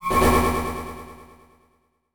Beep Evolver; Adobe; Multimedia Internet CD-Rom Flash

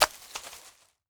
Foley Sticks Dropping On Leaves

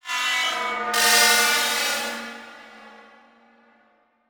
Transition Whoosh Fly By

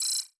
Multimedia Internet CD-Rom Flash Beep Multiple

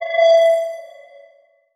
Title Transition Crystal Glow

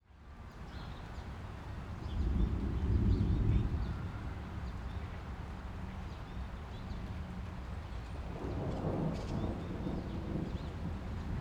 Ambience Storm Light; AdobeCC

